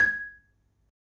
marimba G#4; Terkelsen

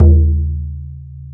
Bodran, EMU PX-7 Drum Machines

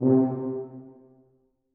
tuba stac C3, Brass; BBC

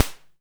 SD, Electro-Harmonix DRM-16

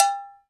Cowbell Hi f; Digital Sound Factory Virtuoso

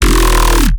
bass hit Growl Blast D#; Virtual Riot

